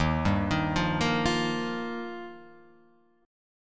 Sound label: D sharp Major Add 9th, Guitar Open F; arpeggiated; D#add9; 3,2,3,0,1,0; JGuitar